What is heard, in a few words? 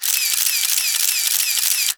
camera shots; Singles Flirt Up Your Life